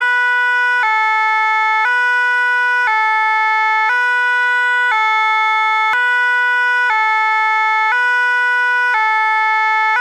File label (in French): pompier